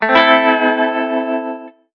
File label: game open; Zoomatch